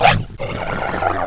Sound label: pit drone alert; svencoop